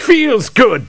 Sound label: LAUGH; BattleSNDS